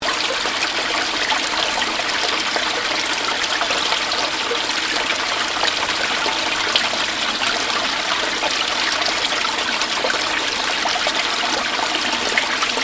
Stream gentle loop, Stream: gentle water running, loop; Nature; Stuart Duffield